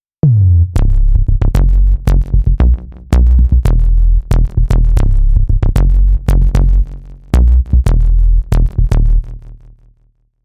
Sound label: Synth Bass